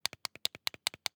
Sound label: Nintendo Wii button; prosoundfx